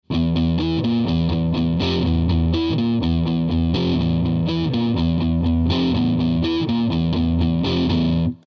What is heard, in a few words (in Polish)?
riff, gitara